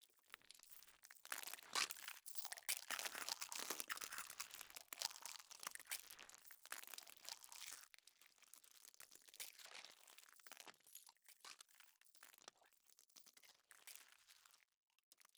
pro ship colossus opened death; ships; biogenesis

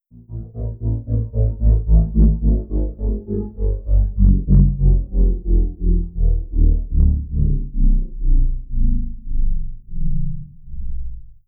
arthropoid colossus wind down; apocalypse

